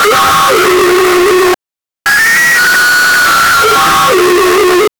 hurt whale sound

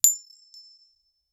short satisfying coin pic; sound design

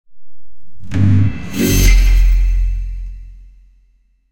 fashion sound effect, music

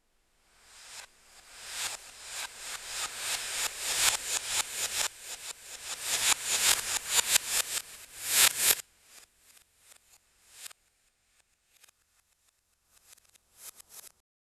there are plastic letters, science

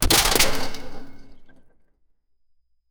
deep metallic latch clunk

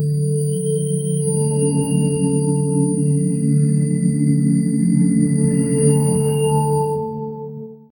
an old computer; 80s

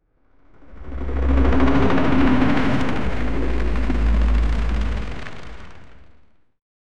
a massive helicopter hove; skyscraper

